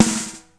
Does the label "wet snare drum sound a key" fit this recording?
Yes